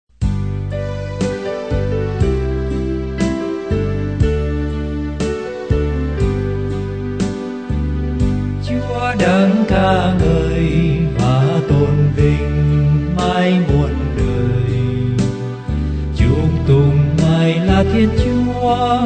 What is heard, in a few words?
BASS